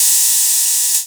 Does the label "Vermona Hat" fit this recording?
Yes